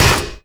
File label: radio impact lg, metal; physics; Counter-Strike Global Offensive